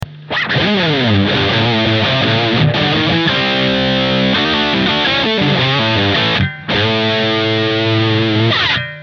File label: Supercharged Lead